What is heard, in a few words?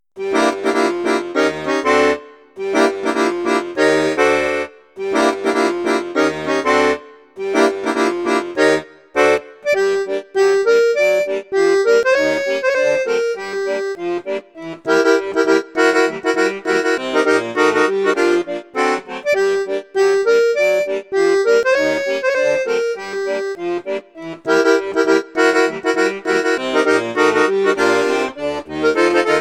registre basson piccolo, accordeon; cavagnolo vedette 10